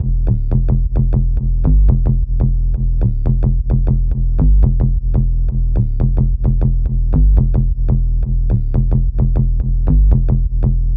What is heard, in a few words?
Syn Bass